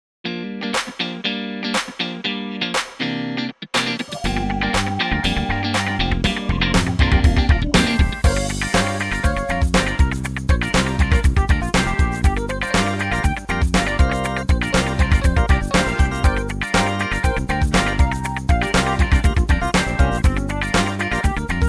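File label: Instrumental Funk Short Version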